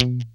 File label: mute B1, strat; InVision Interactive; Lightware VOL-1; Instruments & Percussions